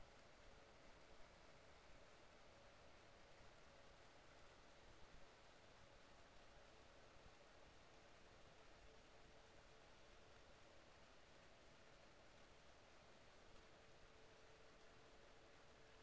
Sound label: HYDROPHONE 16000Hz; JASCO AMAR HYDROPHONE 740